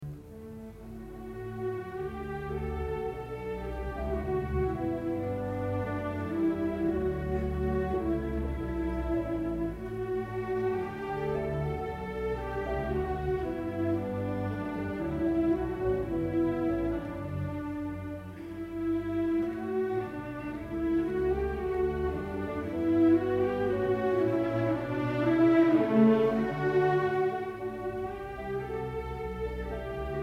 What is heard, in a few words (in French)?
theme fg vla vc cb; première variation; chantée par les cordes graves auxquelles s'adjoignent les alti; Beethoven